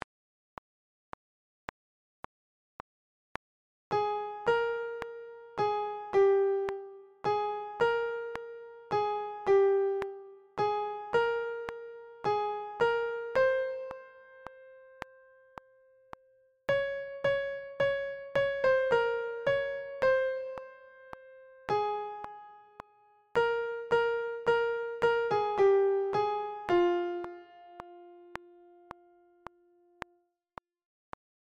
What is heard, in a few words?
Soprano Piano Track; Fiddler Auditions